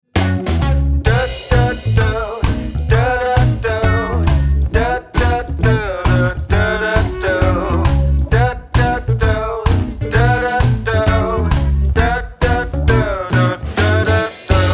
telephoneleid

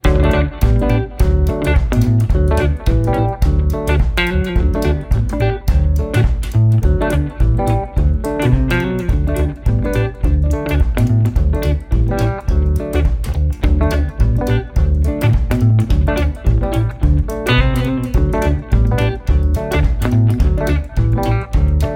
colour song instrumental

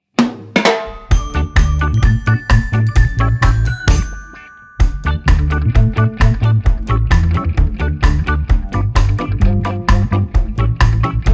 question word song instrumental

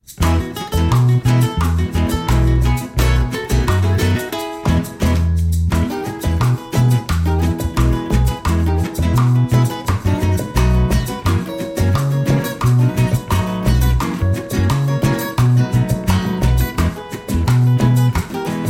wir waschen instrumental

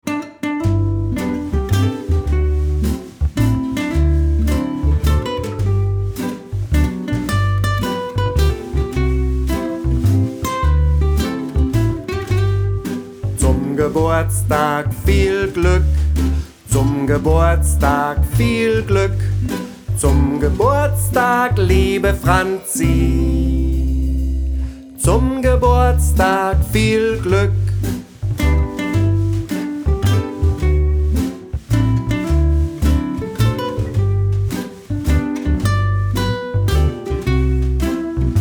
Happy Birthday Jazz; Zum Geburtstag viel Glück (Jazz); German with Felix and Franzi II

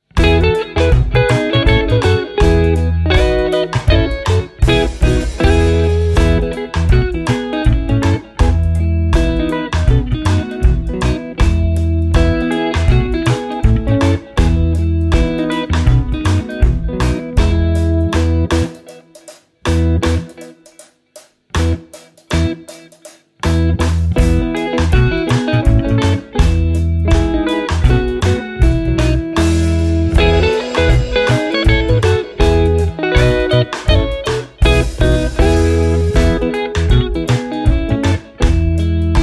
instrumental

